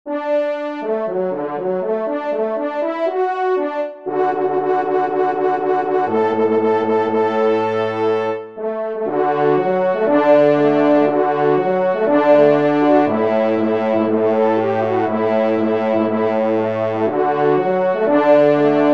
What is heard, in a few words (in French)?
trompe; Rallye Lorraine; 5e Trompe